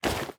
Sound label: equip leather, armor; Minecraft; neoLegacy